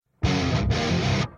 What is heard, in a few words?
heavy metal notif, rock; ringtones